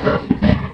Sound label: grunt; snapfire; mortimer